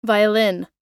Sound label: violin